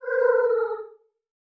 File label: Curseskull Drop